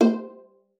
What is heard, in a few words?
C5, VIOLA FINGER; Xsample VOL-5